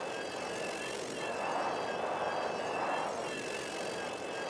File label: Orbital Dagger Fly; TANK; 3Souls